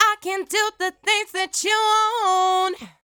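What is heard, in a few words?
112, Eb minor, Adlib Female Vocal Dry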